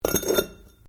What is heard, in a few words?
METAL ON STONE SCRAPE; Metal (Sound Effects:Materials)